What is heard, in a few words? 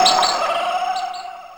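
jungle; Back In Time Records; Big Bang; SFX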